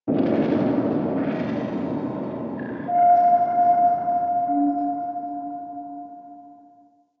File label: Deep Space Booms; Ambience; Exterior; Warframe; Railjack